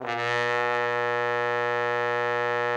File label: TROMBONE; Best Service